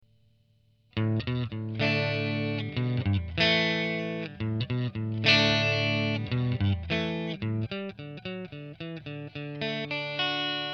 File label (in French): clean sans, guitare